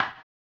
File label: HAMBONE, Vocal Blues Soul; Spectrasonics; Planet